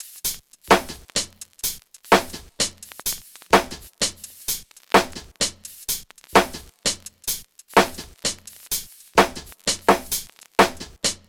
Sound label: Drums 85bpm Top Loop Hip Hop